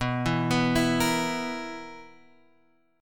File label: B Major 7th Suspended 4th, guitar chord